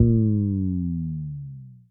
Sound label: Bass Drop